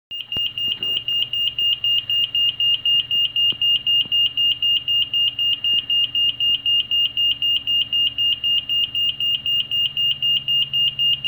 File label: PULSE PIEZO